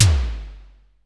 Drum X Tom, Pearl Drum-X

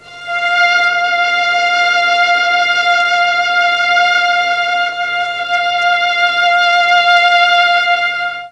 F5 300 VIOLA, Mellotron; Mike Pinder - Mellotron Archive CD

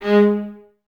String Ensembles, Violas, VLS Detache; Miroslav Vitous

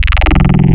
Vocoder Swp, wavetables; Animoog

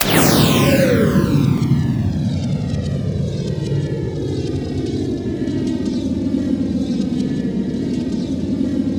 JETTISON, WARHEADS; Spectrasonic Distorted Reality 1; WARHEADS 2

